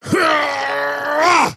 attack effort large; dota; axe

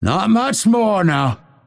lasthit pain; dota; phantom assassin

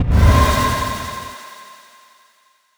thirst cast; dota; bloodseeker

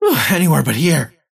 teleport pain; dota; kez

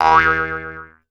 boing

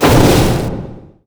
projectile impact; weapons; dota; obsidian destroyer

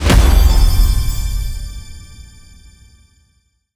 trophy levelup; ui

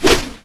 preattack; phantom lancer

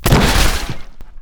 death impact amored; body; physics

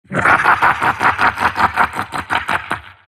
silt laugh; dota; siltbreaker

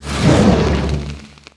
burn layer; weapons; dota; shredder

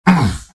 pain, vo; arc warden

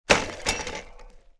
unicycle cast; dota; ringmaster

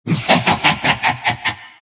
nyx laugh; dota; nyx assassin

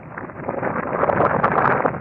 fissure destroy; weapons; dota; earthshaker

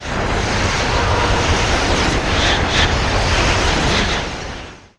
tornado; weapons; dota; invoker

